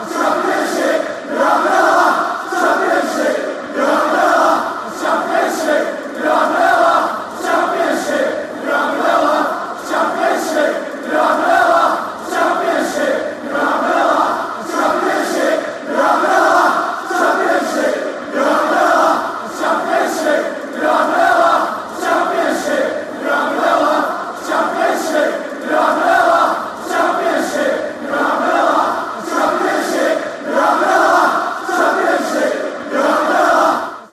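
championship yer having laff rotherham, football chants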